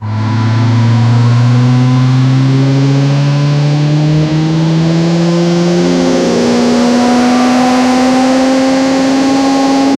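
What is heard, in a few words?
very loud sounds of engin, airbus a380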